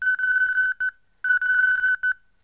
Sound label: alarm loop